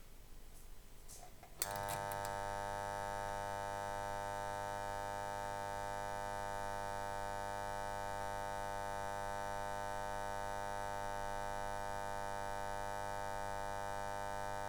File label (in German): Trafo belastet DC